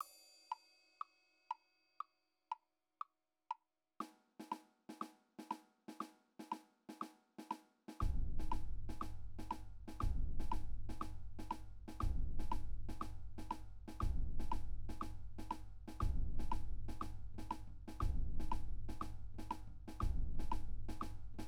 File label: Percussion Ensemble 4 Players, Instrumentation: Snare, Concert Bass, Triangle, Ride Cymbal, Temple Blocks, Concert Toms; Level: Easy / Medium